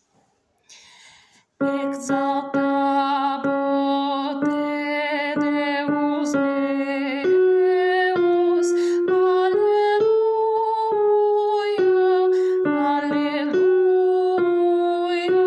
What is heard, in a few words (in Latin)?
exaltabo contralto